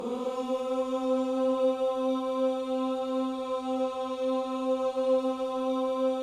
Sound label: OOH C 4, Gospel; Spectrasonics Vocal Planet